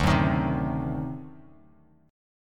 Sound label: D 7th Flat 9th D down Bass Standard; D7b9 strummed; JGuitar